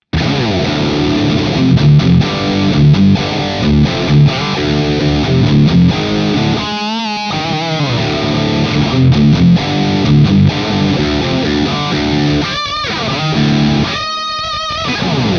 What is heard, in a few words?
heavy